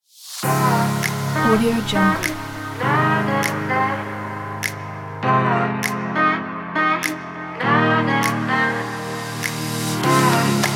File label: tropical summer